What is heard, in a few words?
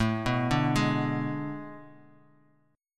A Major 7th Suspended 2nd Suspended 4th, Guitar; arpeggiated; JGuitar